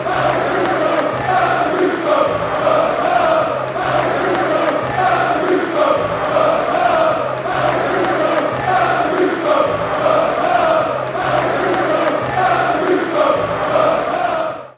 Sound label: player chant, fan chants; malo gusto; chelsea